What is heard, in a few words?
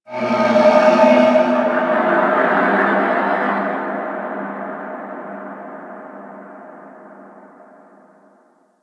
Moon Creature Large, Ambience; Warframe; Orokin